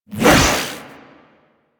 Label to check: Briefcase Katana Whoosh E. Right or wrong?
Right